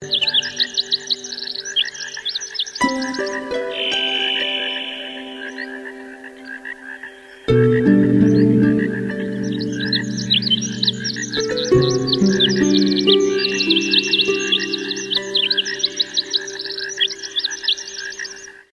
Birds Alarm Tone

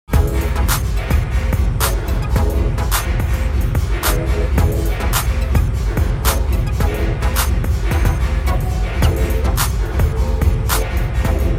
Samsung Galaxy Tab S6 Lite Ringtone

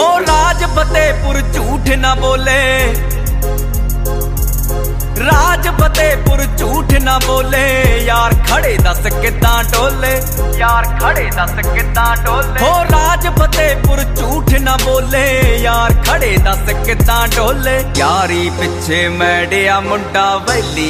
Punjabi Song Ringtone

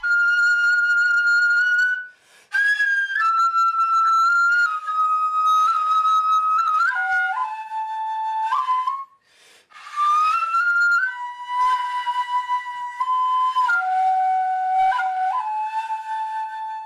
Instrumental Ringtone

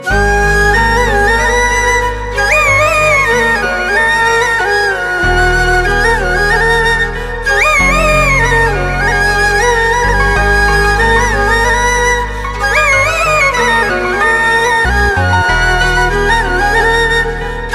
Bollywood Song Ringtone

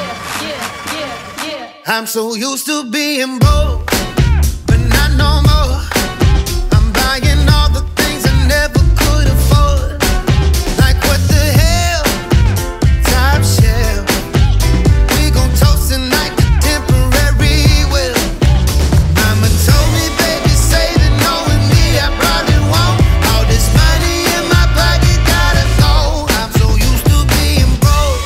english ringtone

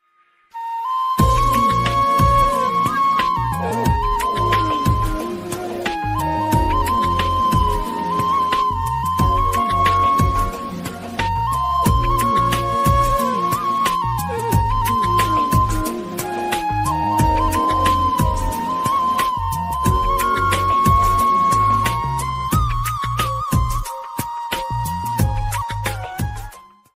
Peaceful Flute Ringtone

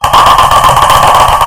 Table ringtone

